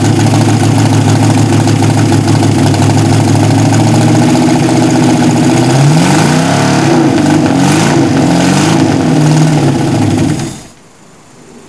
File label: v8 mini